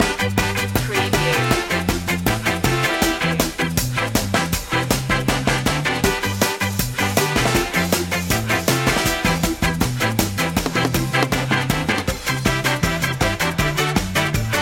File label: happy ska